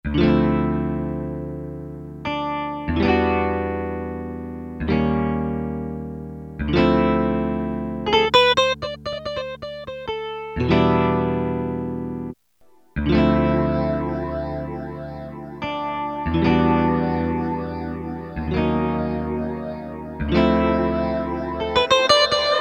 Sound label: NLR PHASER, Boss SX-700; Factory Preset